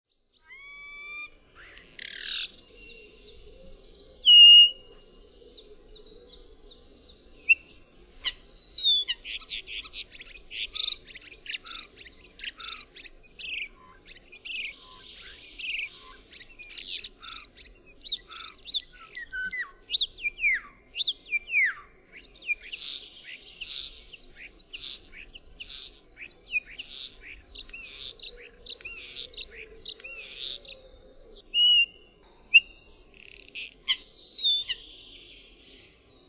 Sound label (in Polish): SZPAK, birdsounds